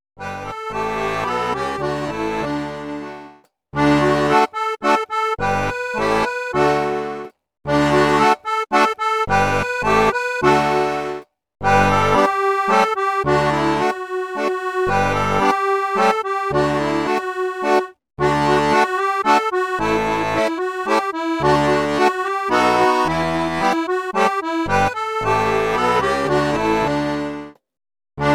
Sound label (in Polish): Pastuszkowie mili ze snu powstali accordion, pastoralka; kantyczka